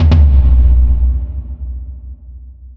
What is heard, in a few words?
attack step; cockatrice